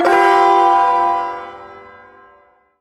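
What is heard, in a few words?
Shawzin Major Chord; Warframe; Duviri Ersatz Shawzin; Chords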